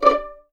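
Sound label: D5 pizzicato F, violins; East West; The Ultimate String Collection; VLNS PIZZ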